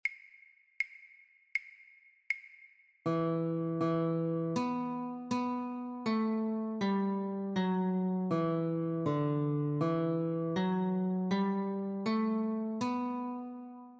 Notes, guitar; God Rest Ye Merry Gentlemen; christmas

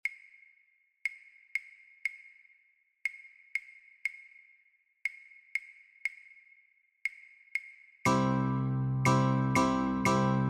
Chords only, Guitar

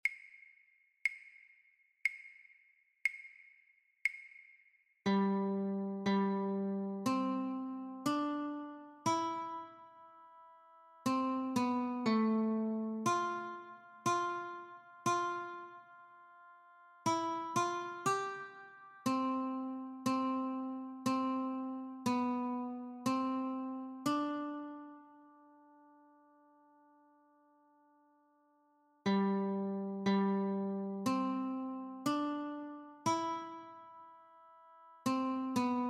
Notes Only, guitar; Home on the Range

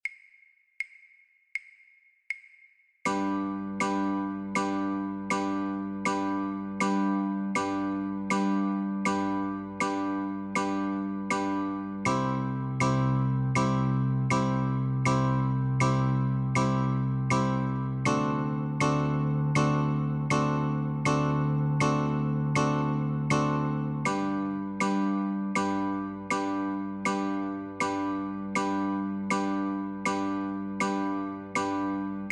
Jingle Bells Chords, guitar; christmas

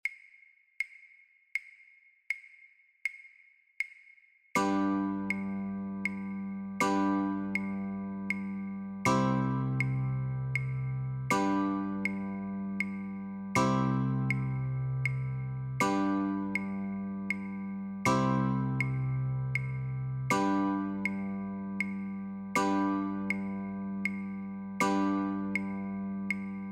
Chords, guitar; christmas

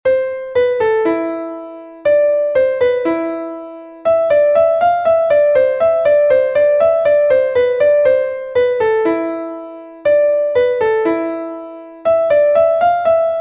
Rondo, classical piano; Orchestral Suite No. 2

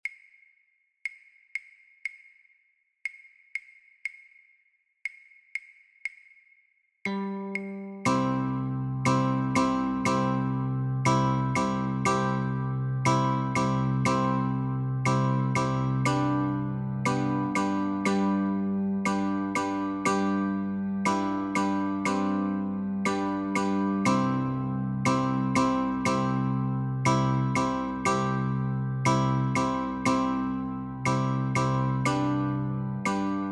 Notes and Chords, guitar; Wheels on the Bus

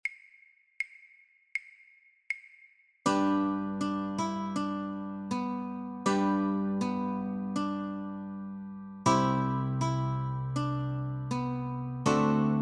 Notes & Chords, guitar; christmas

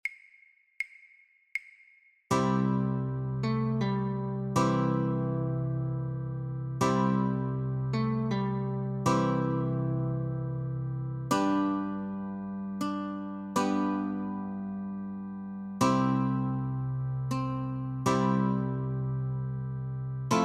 Notes & Chords, Guitar; christmas

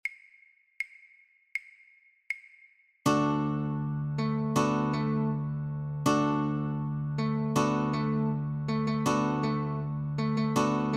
Notes & Chords with E7, Guitar; Handels messiah; christmas

